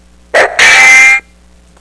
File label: Sword Sound